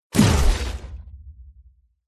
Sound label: impacts thump impact with breaking glass; zapsplat